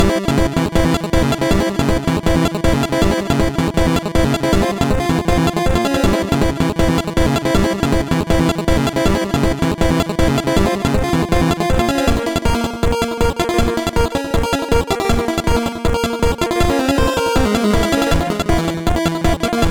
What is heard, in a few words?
boss no intro, Platformer Chiptunes